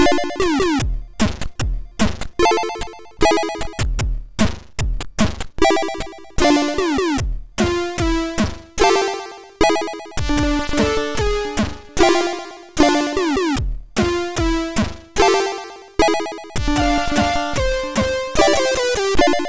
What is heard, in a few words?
sid MOS6581R3